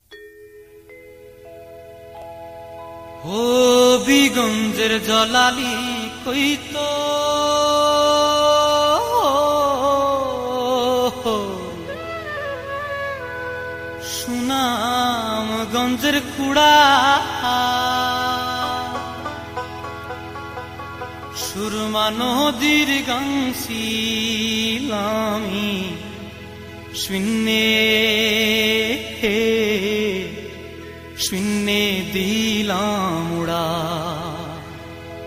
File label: bengali folk song